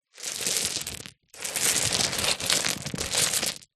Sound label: foley stones movement soft surface; zapsplat